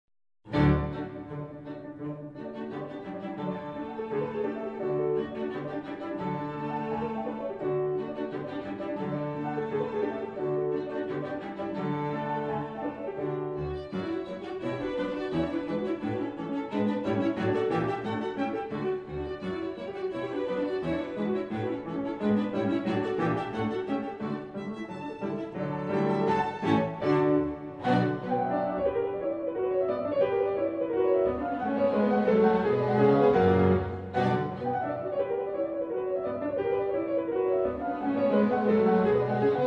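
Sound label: piano trio; reber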